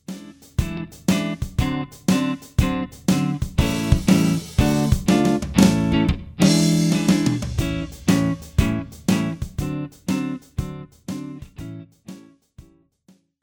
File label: turnaround A